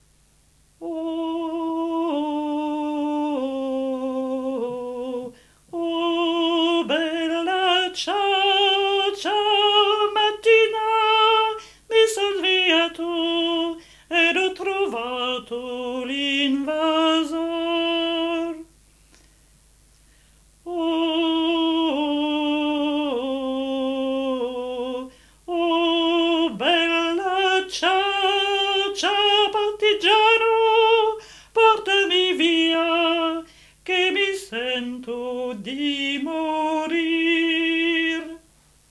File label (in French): chant